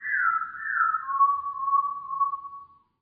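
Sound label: Special Photo Barcode Forest Environment Bird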